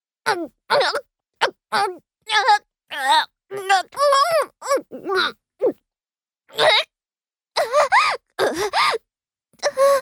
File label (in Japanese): 悶絶→嘔吐